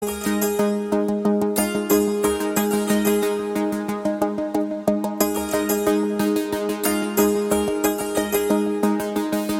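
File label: background music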